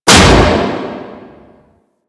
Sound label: Settlement Piston Close; Warframe; Ambience; Grineer Settlement